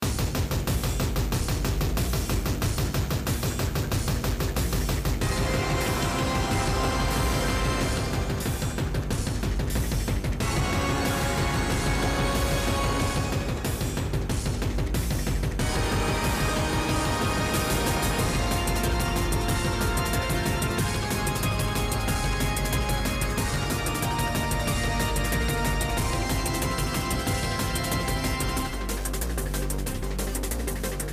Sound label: battle; pokerogue; legendary dusk dawn